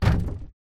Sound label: closet open